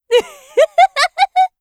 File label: laugh; Eternal Return; Hisui; KOR